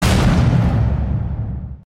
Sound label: Firework Explode; criminality